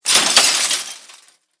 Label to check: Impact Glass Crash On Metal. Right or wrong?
Right